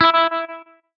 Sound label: Hitsound menu note